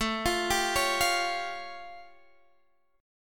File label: A Augmented 7th A, Guitar Drop D; chord